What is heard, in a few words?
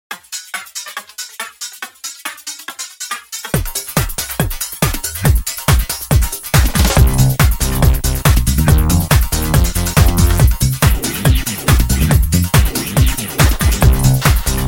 electronic house